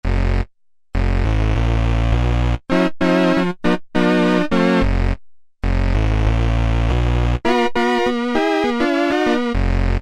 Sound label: Oldies Organ; Roland SR-JV80-11 Techno Collection